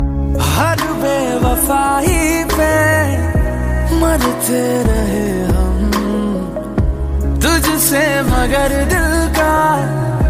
Sad Hindi Song Ringtone

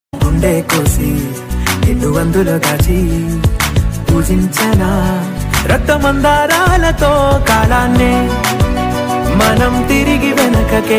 Telugu Movie Ringtone; ringtones

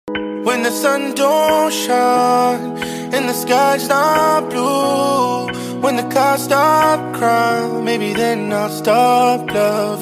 English Ringtone

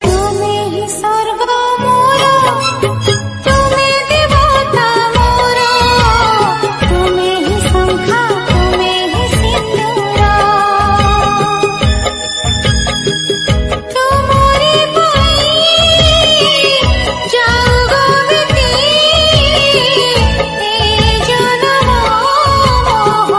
Odia Song Ringtone

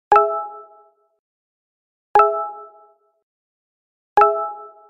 Message Tone; Hangout

